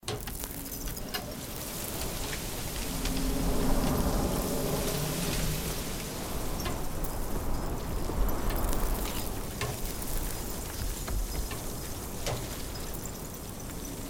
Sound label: Bridge, AMB; Blind Quest - The Frost Demon